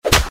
throw shoe

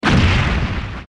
damage fire

